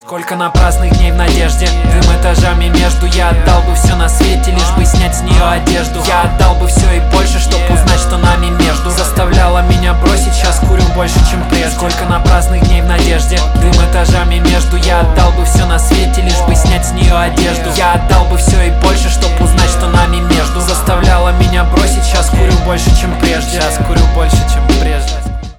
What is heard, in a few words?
polka